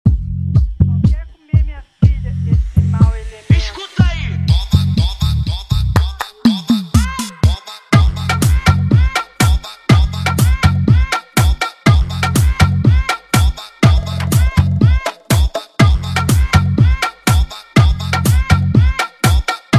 mega funk automotivo

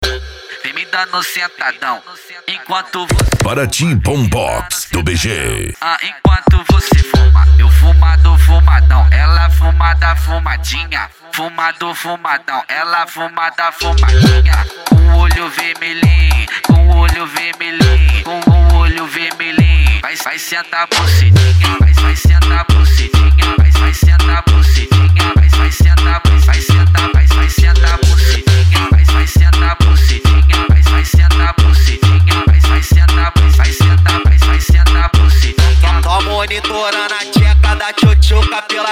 bombox funk

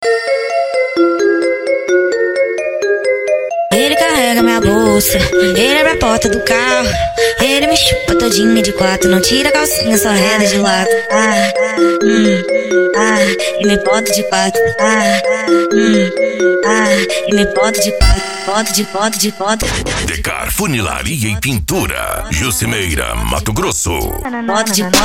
funk ritmado

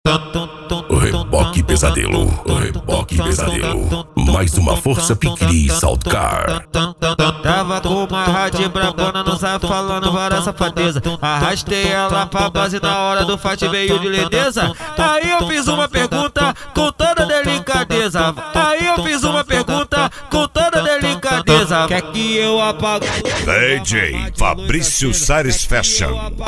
sound car

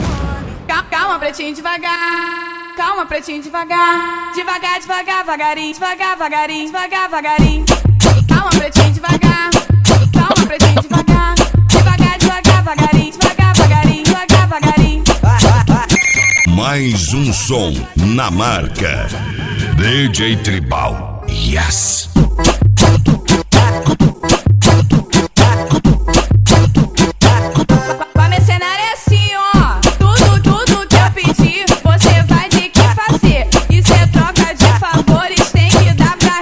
funk bass antigo; cgb cargo transporte e logistica

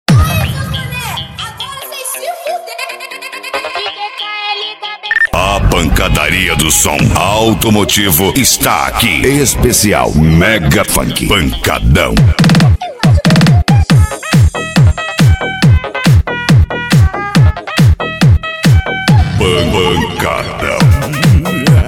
mega funk pancadao

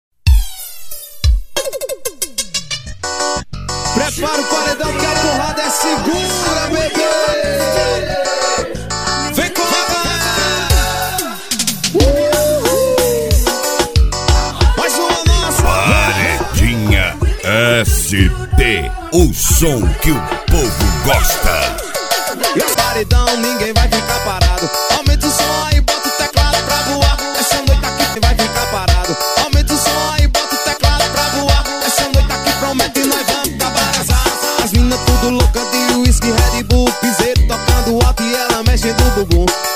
tocando alto; cd paredinha st colatina vol 03

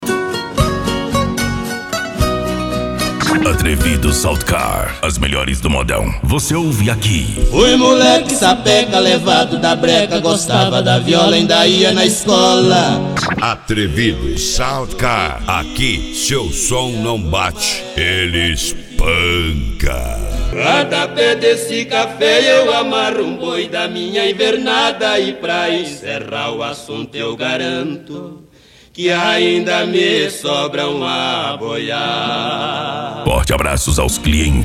modao

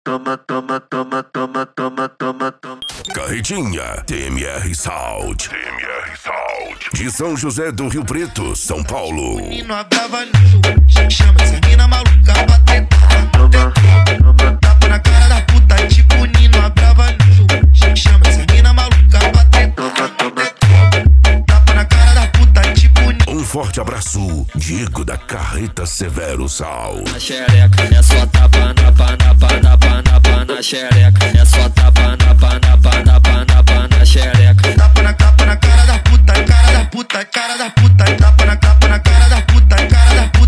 esp insane sound

